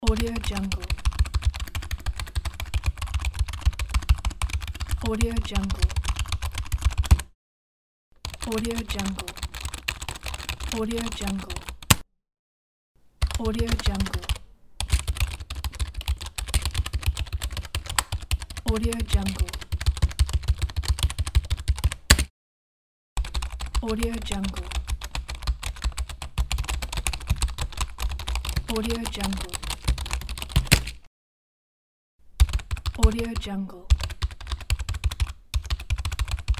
keyboard typing

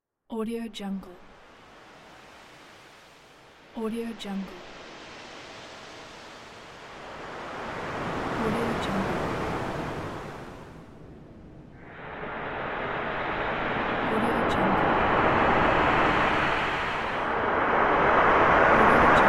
stormy offshore night with big waves

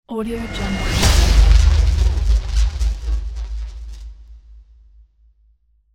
aggressive swoosh

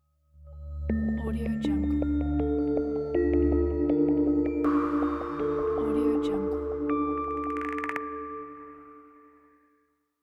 scary horror logo

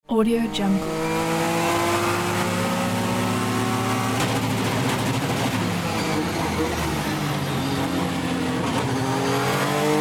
inside fast sport car; djsapa

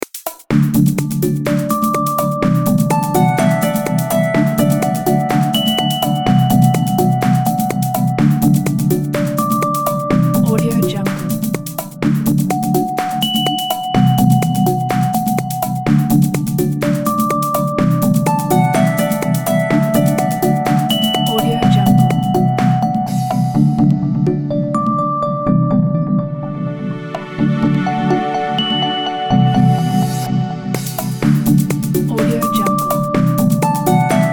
countdown loop

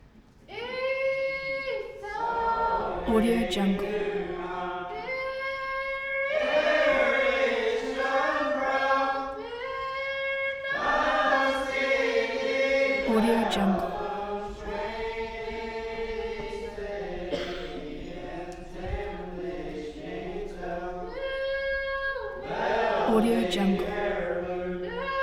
amish hymn boom

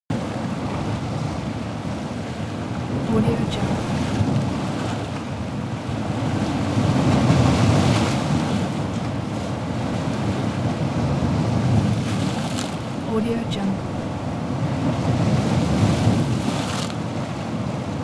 atmo sea coast breaking waves; smartsoundfx

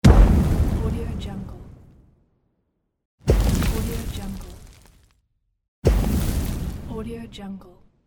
fire explosion

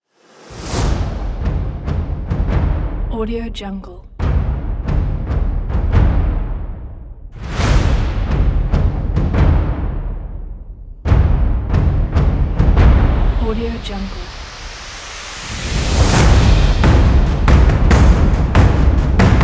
drums action percussion trailer kit

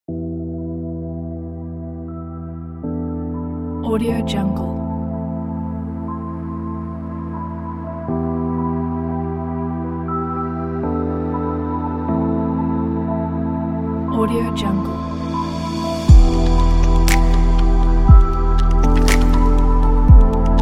ambient chill electronic; ambient chill electronic pack

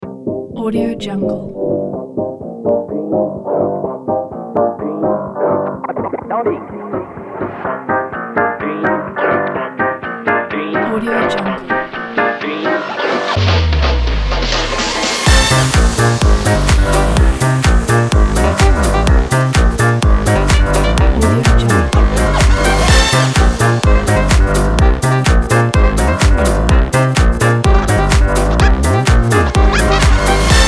the electro swing

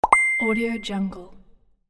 pop ding

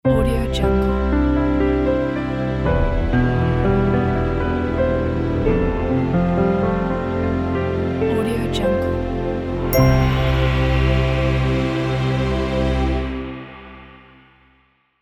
classic logo

